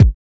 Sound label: kicks